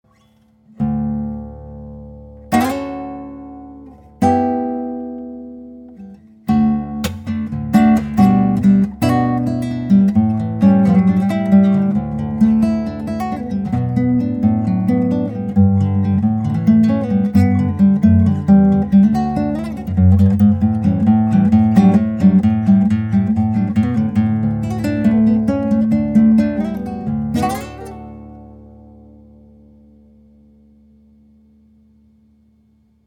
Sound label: Guitar